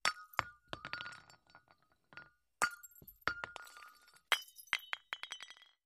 Light Bulb Breaks

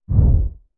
Bass Impact Whir Syn

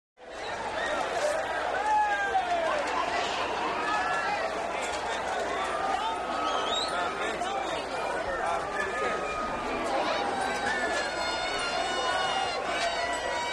Large Mardi Gras Crowd Walla, Yells & Whistles.; Parade

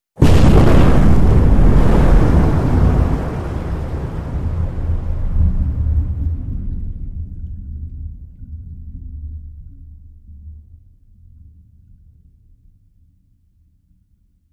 Underwater Explo, explosions

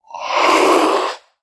Puma Cougar Hiss Long, big cats cougars

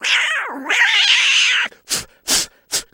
Cat Angry Squeal To

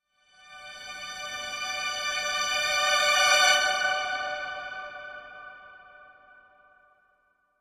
Violins Optimistica; strings

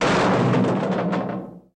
Garbage Cans Tumble

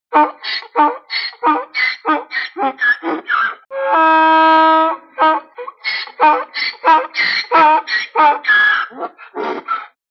Donkey Bray; Donkey Braying.

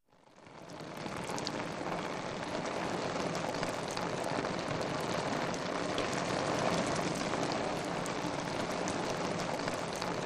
Rain Steady Rain, raindrops, splatty

